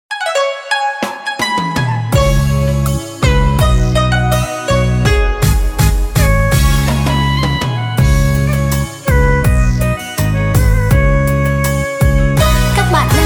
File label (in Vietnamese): Sau le bong beat